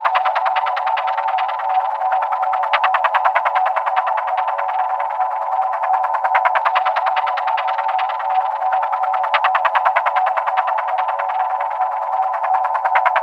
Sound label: Moon Wind F#, Lunar Drones; Zero-G Seismic Frequencies